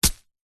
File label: warfare knife stab into soil dirt single